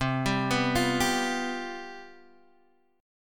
C Major 7th Suspended 4th C down

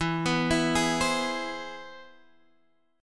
C Augmented Major 7th E, chord

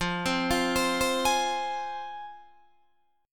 F Minor, Guitar Open D

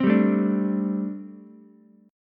G Suspended 2nd Suspended 4th, Ukulele; Gsus2sus4 strummed; JGuitar

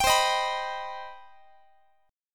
C Minor Major 7th, Guitar; strummed; JGuitar

